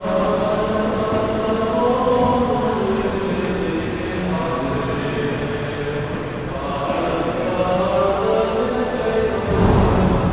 public places church ambience after mass orthodox romania; felix blume